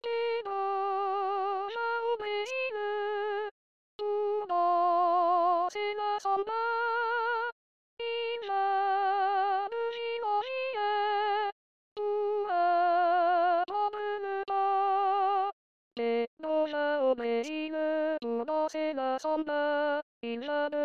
femmes 1ere voix

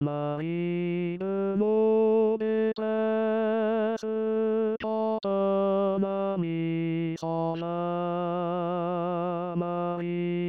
marie de la tendresse tenor

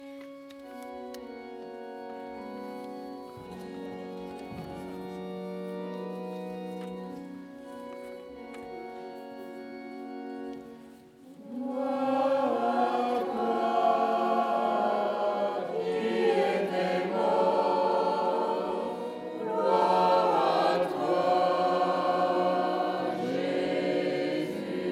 Gloire a toi, chorale

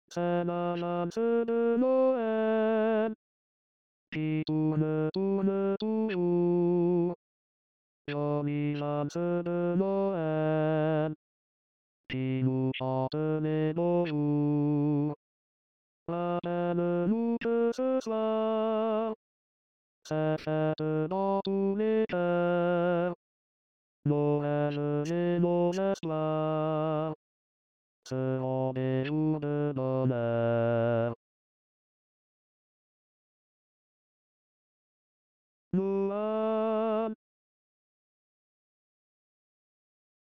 voix hommes

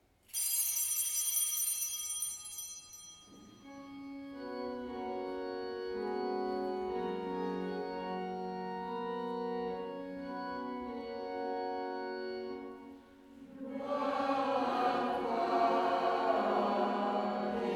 air ecossais, chorale

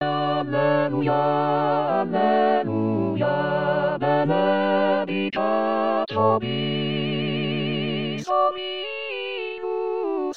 les 4 voix; benedictat vobis

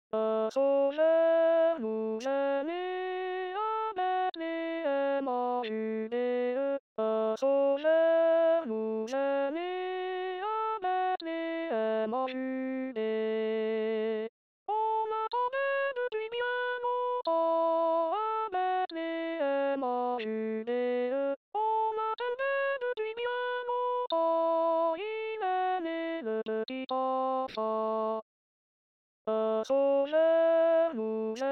alto, chorale; un sauveur nous est ne

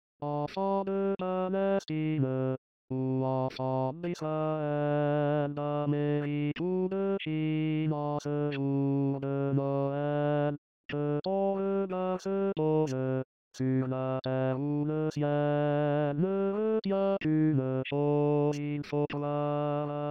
tenor basse

